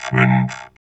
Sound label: VOX VOX, Electro Vox